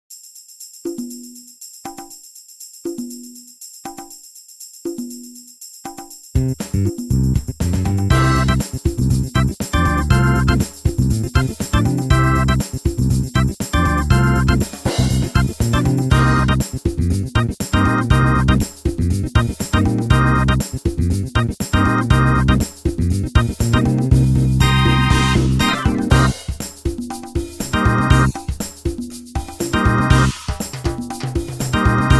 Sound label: set korg factory